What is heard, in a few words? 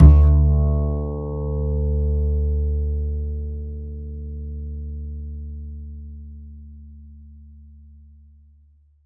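double bass D; Univers Sons; Jazzistic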